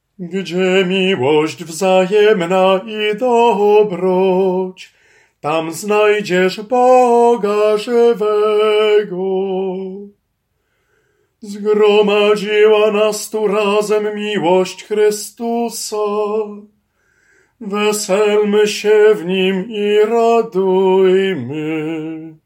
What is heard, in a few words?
SOPRAN; Gdzie milosc